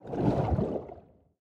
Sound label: spin end; reef raider